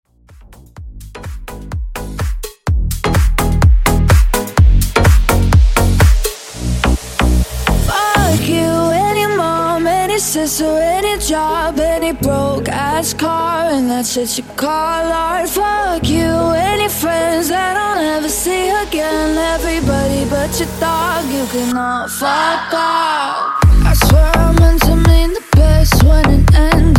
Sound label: top40 slap house mashup